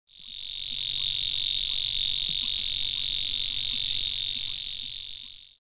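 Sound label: solovinyy sverchok voice, river warbler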